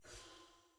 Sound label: generic item hover